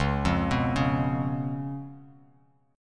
C sharp 7th, C sharp, down

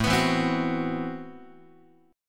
A Minor 6th Add 9th Guitar, chord; Am6add9 strummed; x,5,2,2,4,5; JGuitar

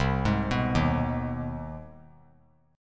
C Minor Major 7th Sharp 5th chord; CmM7#5 arpeggiated; JGuitar

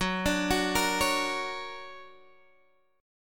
D Augmented Major 7th Gb, guitar

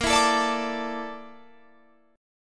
A sharp Major 7th Flat 5th A sharp Guitar Drop D

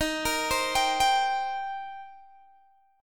Eb 6th Eb down Guitar Open G

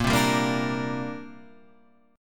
C 7th Suspended 2nd A sharp

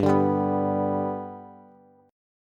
G Major G down Bass; G strummed; JGuitar

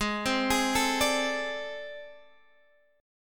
A Minor Major 11th

